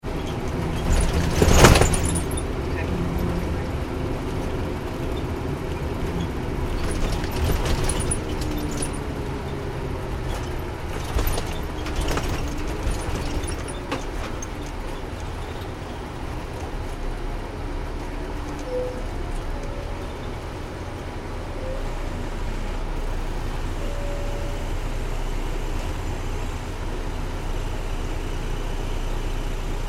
transportation bus driving nyc internal, Bus Driving In City (Internal); Bus Driving In City ( Nyc ) - Internal Recording; Busses; Vehicles; McKinney Sound